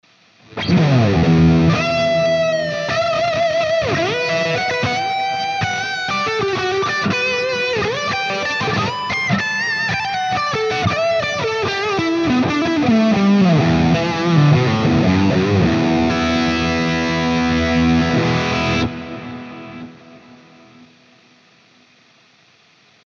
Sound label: Friedman Crunch Delay Reverb